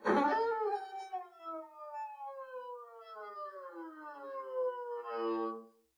arco noise screech doglike, double-bass

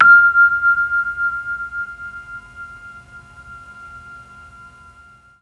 F4 VIBES, mellotron; MKII

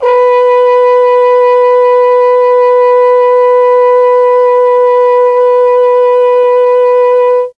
B3 TROMBONE, mellotron; CHMB TRMBONE

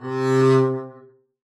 arco c3 ff up, double bass

